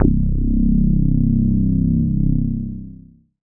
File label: bass c, Sub Bass; Dance Synths; Samples vol.37 - Dance Synths